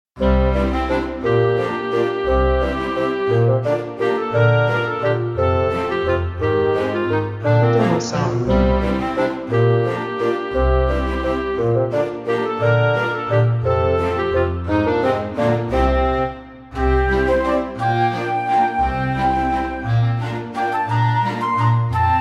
Playful Stroll Jumpy Flutes

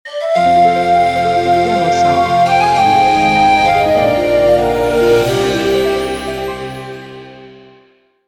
Magic Soft Bright Intro or Happy Ending

